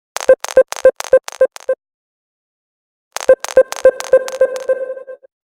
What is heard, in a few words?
grains, nothing phone